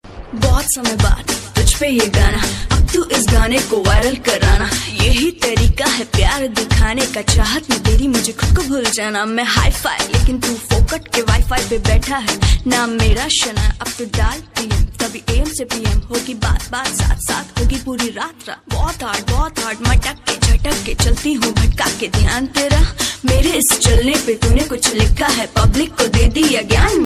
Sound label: best romantic ringtone, bollywood ringtones